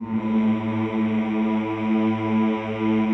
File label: CHO, Mmhh; Sound & Vision; Gigapack I CD 2; CHO Mmhh + Rrrr